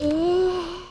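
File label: dead fairy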